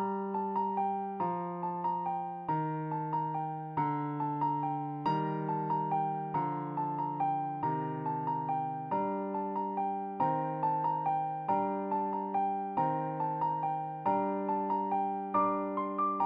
Carol Bells; Piano app by Yokee

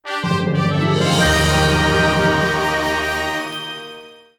Goal Fanfare; crash bandicoot series sound box; Once Upon a Tire; 2nd Place

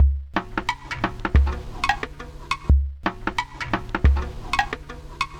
Summer Drums

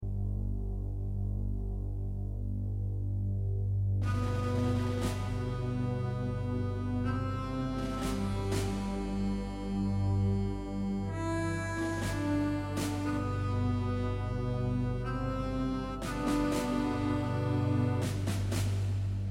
American Defeat

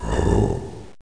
spawn zombie

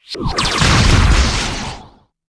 Archer Rain of Arrow; Inotia 3

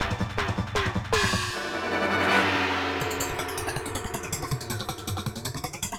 Action END

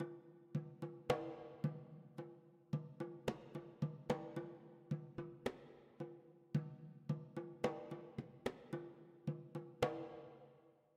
Drums Mid

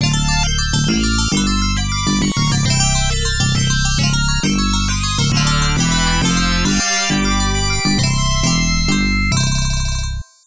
Round Complete; Ikasuze Ai no Doki Doki Penguin Land; Genesis

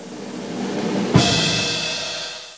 Winning; ABC World Reference Wide World of Animals

